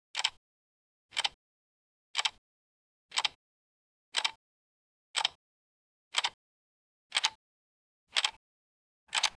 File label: wood block metronome tick, timer